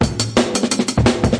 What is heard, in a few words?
172 acoustic speed racer, drum loops and breaks; 161 to 180 bpm